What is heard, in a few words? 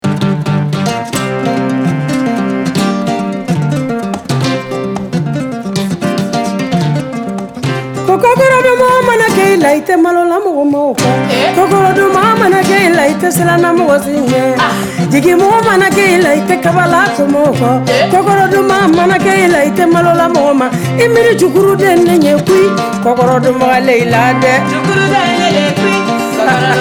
Acoustic